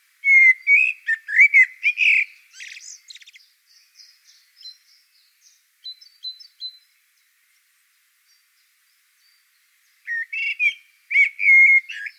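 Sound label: blackbird voice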